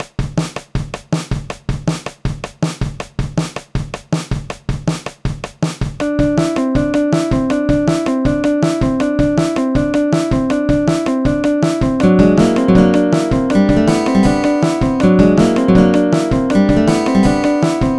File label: Beat